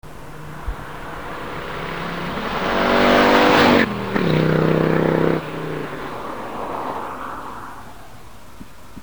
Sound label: Audi Urquattro 10v Flyby